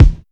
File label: raw kick drum sound d sharp key